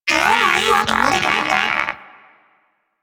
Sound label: Moa Pet Player Down; Warframe; Tenacious